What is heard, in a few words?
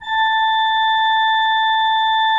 A4, Cathedral Organ; ILIO; Propeller Islands Cathedral Organ